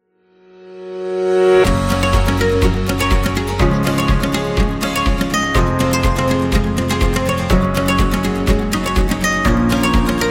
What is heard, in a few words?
Upbeat Acoustic Guitar Background; happy music vol 9